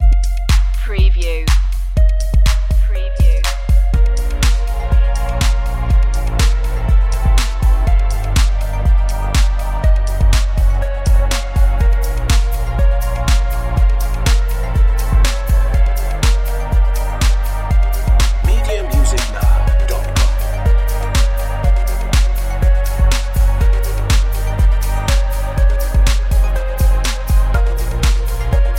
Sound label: synth dance